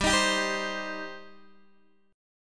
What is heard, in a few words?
G Suspended 4th Sharp 5th, Guitar, DADGAD